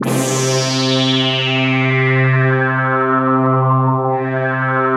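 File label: SYNT -R, synth, Drum & Bass, 160 BPM; Ueberschall; Drum & Bass Resonance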